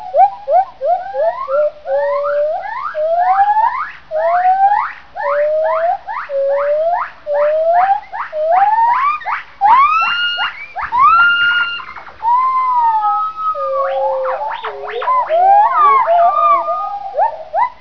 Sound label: Duett, gibbons